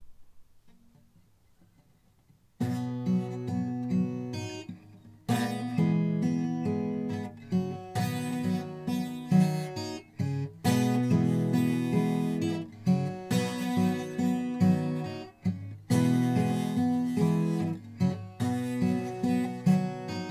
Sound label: pick 12 left, hsguitar